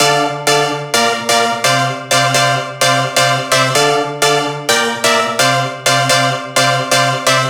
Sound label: synth 128 Dm; Electro; Anthemic; synth loops